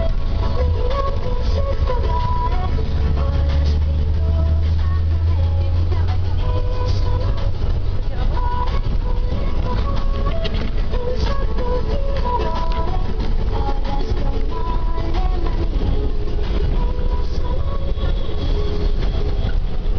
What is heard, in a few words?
music russian